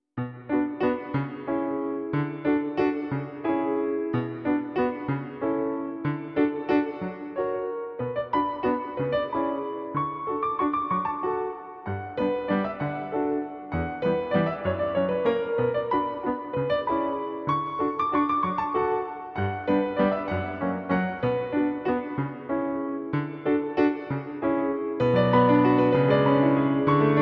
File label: Piano Cover; Zelda